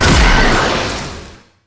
Void Launch, Weapons; Duviri; Incarnon Adapters

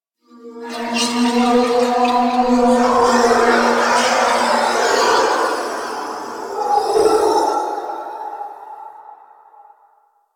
Tower Whisper; Warframe; Quests; TheNewWar; Ambience; Cetus; Unum Tower

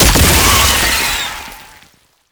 Infested Spore Pistol Explosion; Weapons

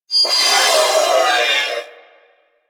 Sentient Symbilyst Death; Enemies; Barks

